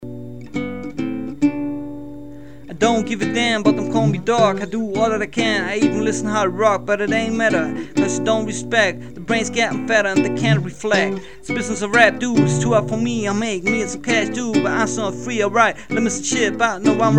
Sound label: beep